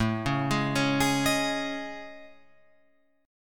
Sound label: A Major Sharp 11th, down, Guitar, Open F, AM#11 chord; arpeggiated; JGuitar